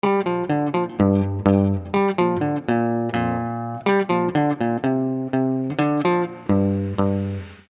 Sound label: solo sms; soobshcheniia sms